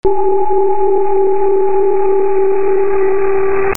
SCIFI

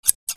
OFFICE

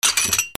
KITCHEN

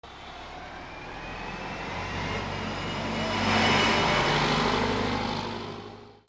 VEHICLES

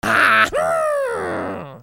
CARTOON

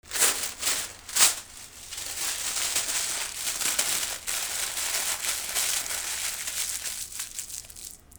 RIPPING

